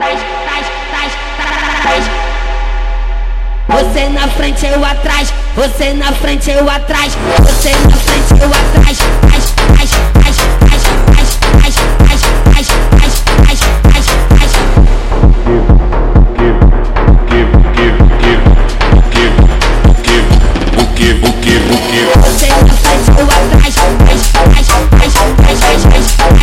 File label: Phonk Music; فانک phonk